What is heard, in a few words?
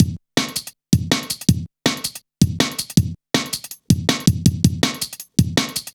Tinkle, drum loop, jungle, 161.5; Zero-G Jungle Warfare 1, 2 & 3